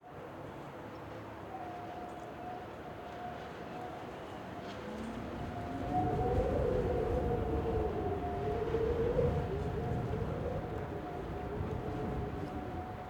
Wind, Ext, Alley, Urban, Howl, Pronounced, Metal, Clinking; Hzandbits